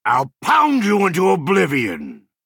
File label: attack; skilled blacksmith